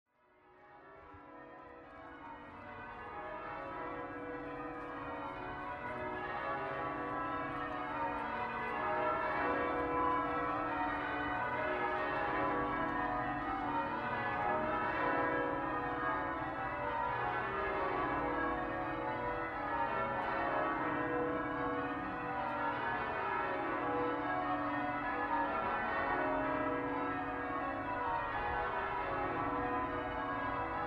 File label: cathedral bells evening